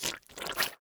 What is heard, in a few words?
enemy tentacle death; sfx